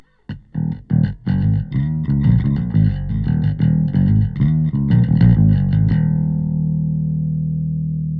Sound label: Sub Bass 808 Bass; SubBass Doctor